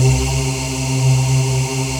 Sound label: VOICE PAD; Best Service; XX Large; Pads